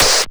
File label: Short Noise; Roland MV8800; SONIQ DRUMS